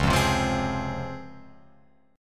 Db Major 7th Db down Guitar Open F; DbM7 strummed; JGuitar

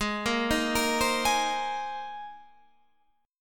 C Minor 7th Sharp 5th, G sharp, chord

